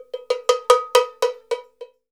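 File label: ambient cowbell, percussion; Univers Sons; Basicussions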